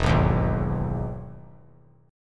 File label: E Major 7th E down; EM7 strummed; JGuitar